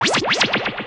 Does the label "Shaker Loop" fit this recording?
No